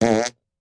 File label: Dry Fart; farts